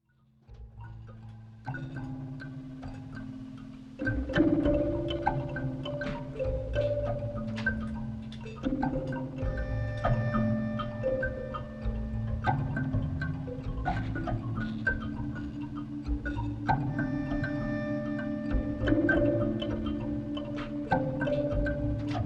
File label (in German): die wand musik